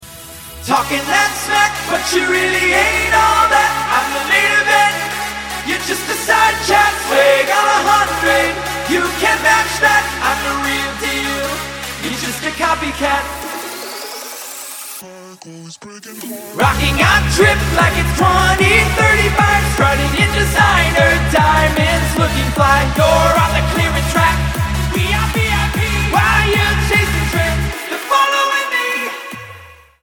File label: Sample, male singers